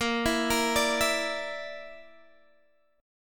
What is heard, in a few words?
Bb Major Flat 5th, Guitar, Open G